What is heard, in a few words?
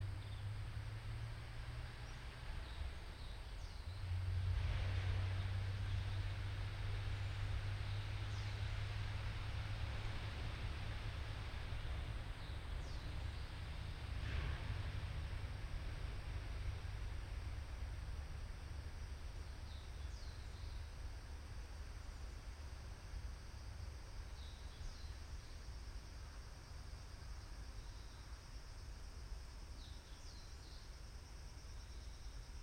yamanakako sound